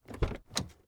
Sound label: door open; Golden Crayon Sounds